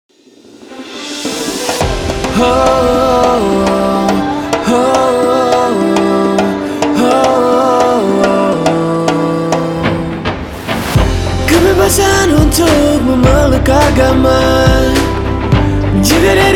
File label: Jingle; Komnas HAM